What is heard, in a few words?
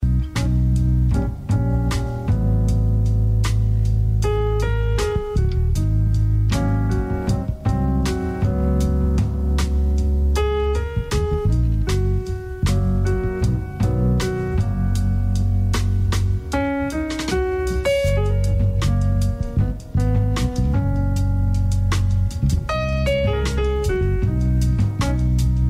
jazz